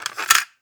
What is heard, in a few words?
Cassette Rattle